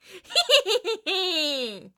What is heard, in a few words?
laugh evil cartoon female scream; Sonniss GDC